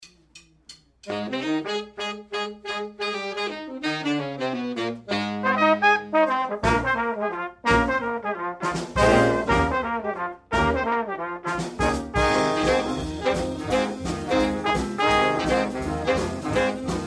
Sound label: Orchestra, swing band